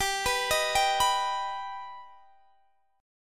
G Augmented, Guitar, Open D; x,22,21,21,22,21; down

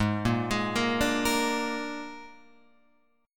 G sharp Suspended 2nd Flat 5th, Guitar Open F; G#sus2b5; arpeggiated; JGuitar

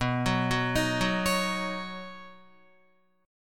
B Major Add 9th, Badd9 chord, Guitar; arpeggiated; JGuitar

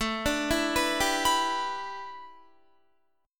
A 7th Suspended 2nd Suspended 4th Guitar; A7sus2sus4 arpeggiated

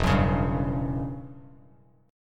E Minor 6th Add 9th E down Bass Standard; Em6add9 strummed; JGuitar

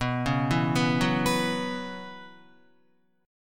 B Suspended 2nd Suspended 4th, Guitar; Bsus2sus4 arpeggiated

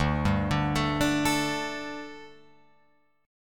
D Suspended 4th D, Guitar, Open F; Dsus4 arpeggiated; JGuitar

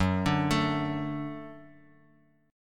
Gb Minor Sharp 5th, Guitar, Open F; arpeggiated; x,1,2,4,x,x; JGuitar

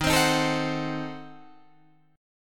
D sharp Diminished 7th D sharp, Guitar, Drop D; 13,15,13,14,13,14; chordshape

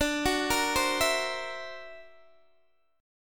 D Augmented 9th down Guitar Standard; D+9 arpeggiated; JGuitar

